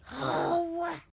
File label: Sigh; Dino Donkey Dash; Darwin